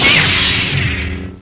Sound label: sword wall, weapons; sfsword